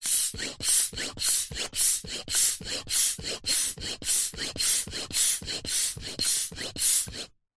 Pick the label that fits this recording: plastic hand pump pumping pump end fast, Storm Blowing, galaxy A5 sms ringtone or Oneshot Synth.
plastic hand pump pumping pump end fast